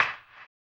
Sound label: HAMBONE; Blues & Soul